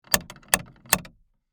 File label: Foley, Door, Knocker, Three, Times; Door Knocking; Doors; BlastWave